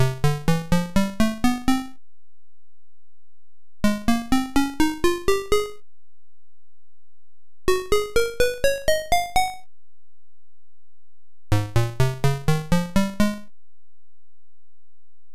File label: Major Scales